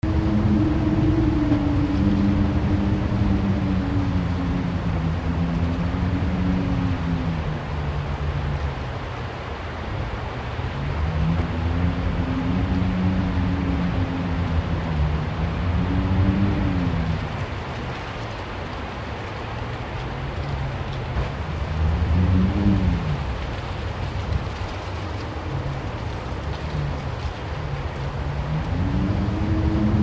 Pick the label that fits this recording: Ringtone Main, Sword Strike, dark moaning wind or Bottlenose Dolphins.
dark moaning wind